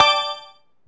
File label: SE GAME GREAT; maimai